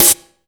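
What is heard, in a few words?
EFEX snare drum, FX DRUM; AKAI S6000 CD-ROM - Volume 1; DRUM RYTHM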